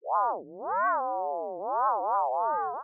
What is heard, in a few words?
Droid Vocal; Fox Audio Post-Production; Mini Library